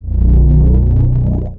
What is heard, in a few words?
cast spell; Grounch